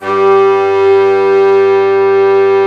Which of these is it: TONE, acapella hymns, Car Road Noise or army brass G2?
army brass G2